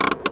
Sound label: handbrake; vcmod